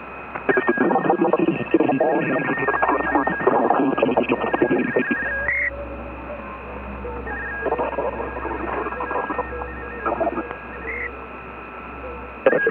VERICRYPT 1100 / CRYPTOPHON 1100 / ANALOGUE SCRAMBLER; F-MILVOCODER